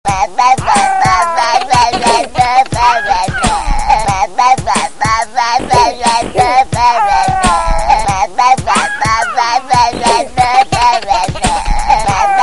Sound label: Rapper Baby